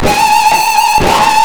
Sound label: SCREAM GTR, GUITARS KIT; Big Beat Samples & More; AKAI